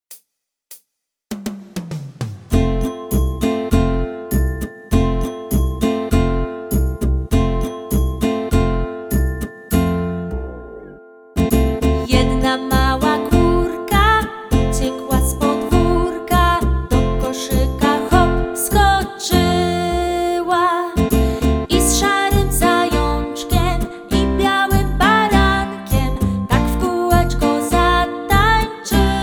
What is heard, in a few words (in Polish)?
TANIEC